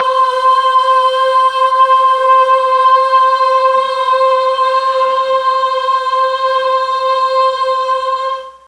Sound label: "C3" boys choir, mellotron